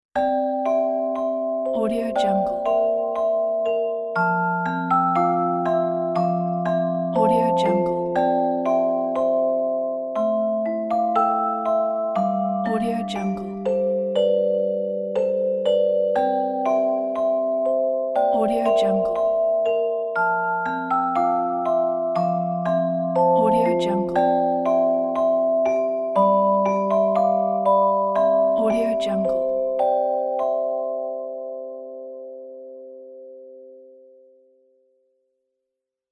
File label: music box lullaby